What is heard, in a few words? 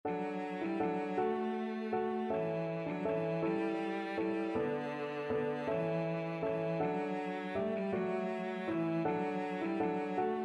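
violoncello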